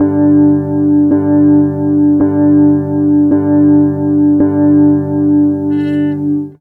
Ac 12 Str Gtr 109bpm G end, Twelve String Guitar, African Guitars; Quazibeat; African Secret